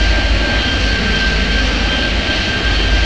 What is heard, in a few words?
engine, aircraft engines